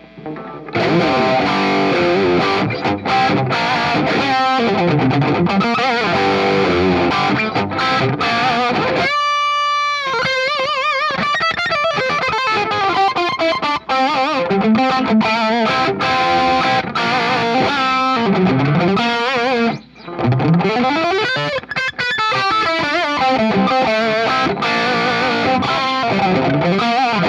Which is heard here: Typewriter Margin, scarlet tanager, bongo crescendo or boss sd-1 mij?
boss sd-1 mij